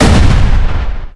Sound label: hit